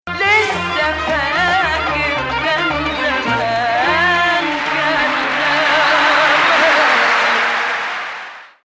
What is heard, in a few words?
Ajam